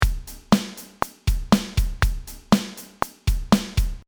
Rythme rock

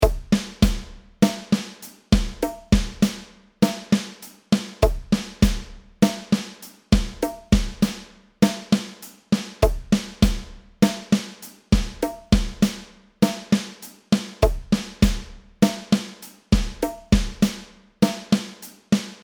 mise en place pied 103bpm

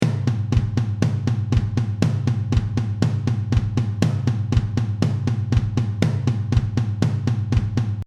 sans caisse claire

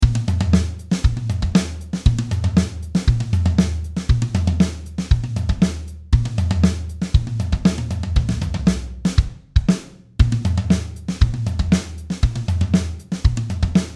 Rythme Complet avec Charley, batteur

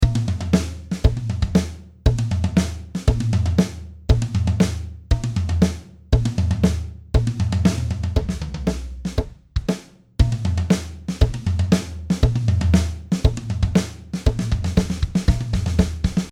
Rythme Complet sans Charley

